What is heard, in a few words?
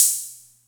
Music Percussion Computer HH open, Drum Machines; Atlantex MPC-1